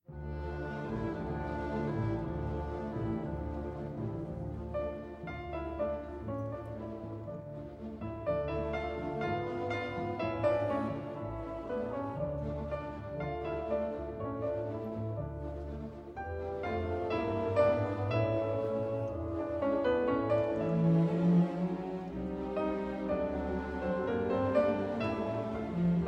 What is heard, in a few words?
Piano Concerto in D Minor Allegro Ma Non Troppo